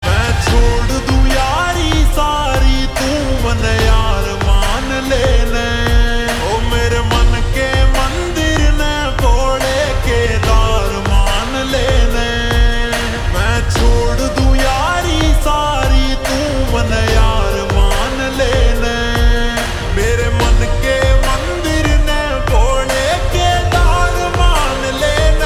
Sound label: Slow Reverb Version Ringtone